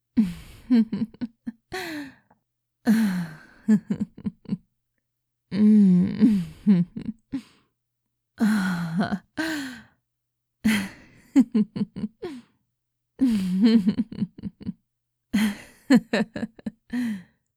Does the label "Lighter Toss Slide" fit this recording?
No